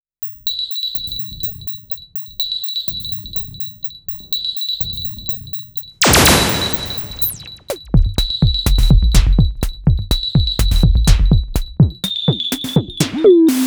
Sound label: Performance Mode Techno; Analog Four MKII